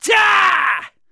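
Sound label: human middle male attack, voice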